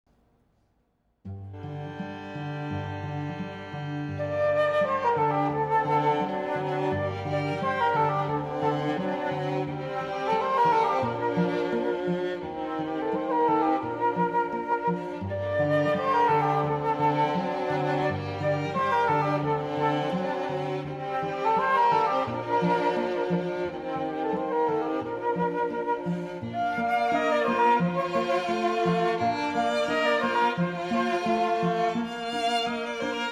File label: Flute Quartet; Dvorak Bagatelle1; Cantilena South Florida